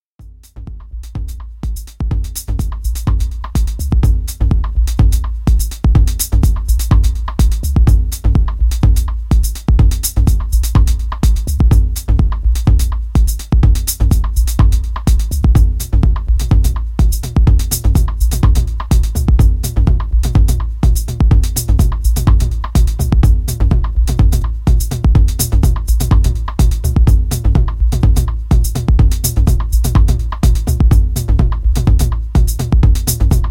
Infinity (Drums)

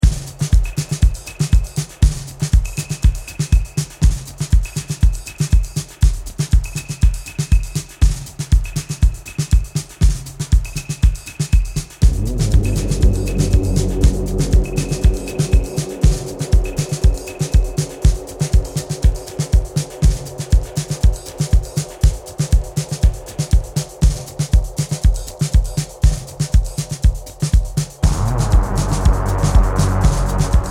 Drum Bonus

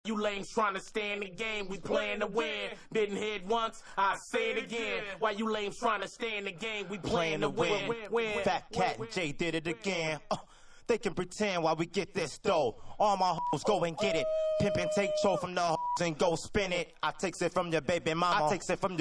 game over (acapella)

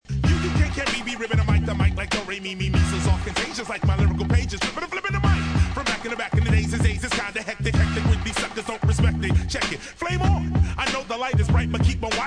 hip hop vs rap

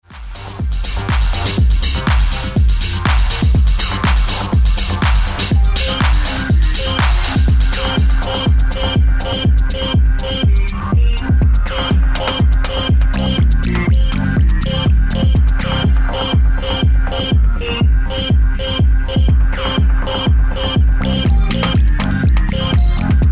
Techno

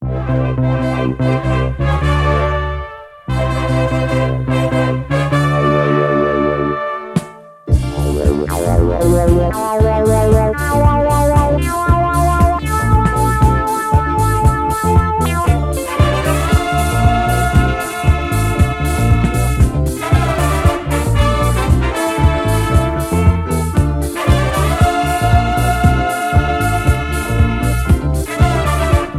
Disco Groove

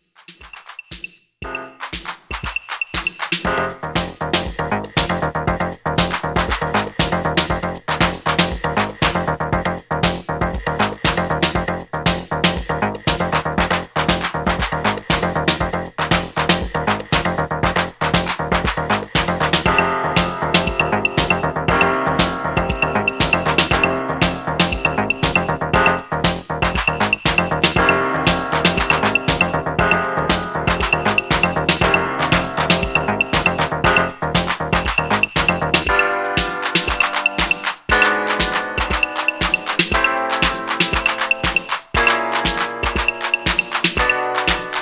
Piano Trax

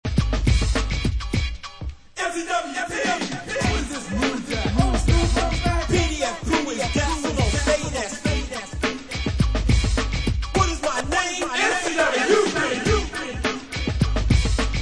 Instrumental

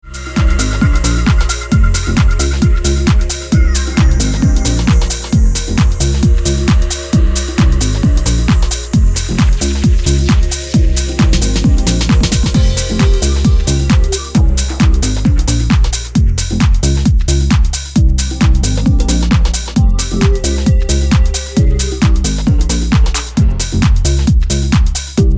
Pterodactyl Phaser; Minimal electro

